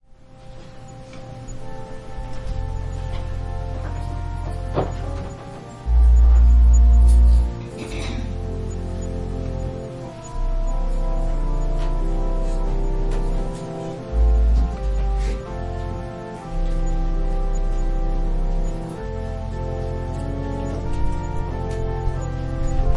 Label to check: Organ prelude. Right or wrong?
Right